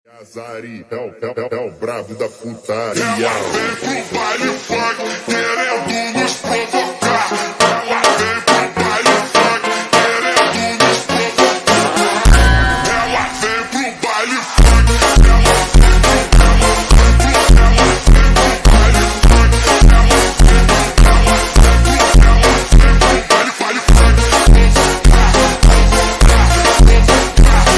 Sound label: slowed reverb; Super Slowed, Reverb